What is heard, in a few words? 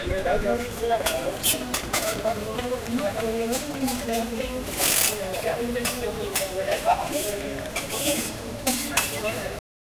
pani puri seller chefs in, street